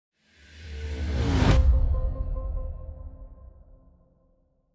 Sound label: Music Trans Preracestart